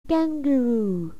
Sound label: kangaroo